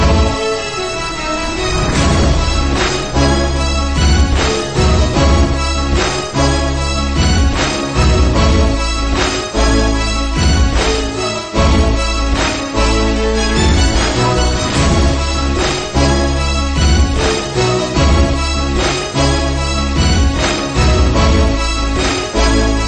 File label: 300 Violin Orchestra